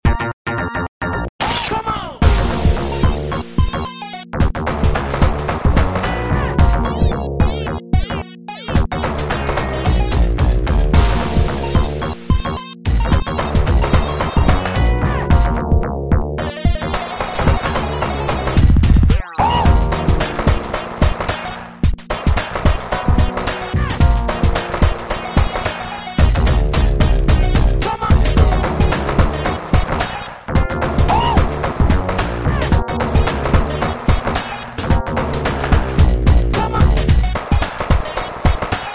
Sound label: tone